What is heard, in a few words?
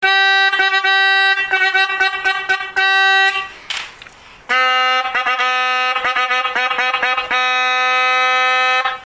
symph toy trumpet, symphony horn c reed; acme 453c